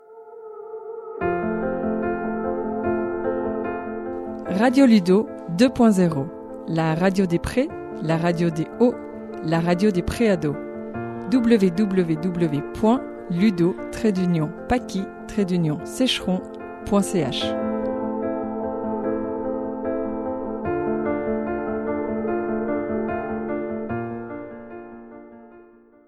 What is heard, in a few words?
Jingle